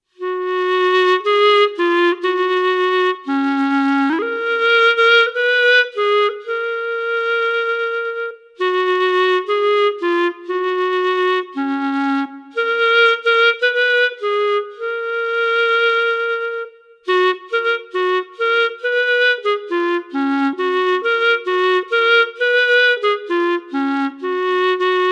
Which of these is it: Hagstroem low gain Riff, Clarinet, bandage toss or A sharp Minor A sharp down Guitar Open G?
Clarinet